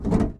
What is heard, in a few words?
car rattle soft; Blind Drive